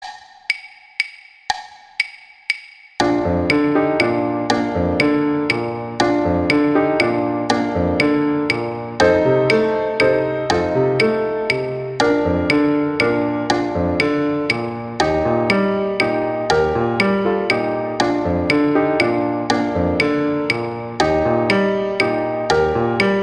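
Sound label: Silent Night accompaniment; Vc; Gruber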